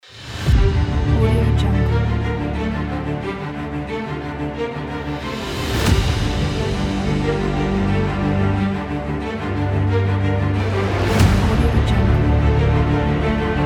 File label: epic powerful trailer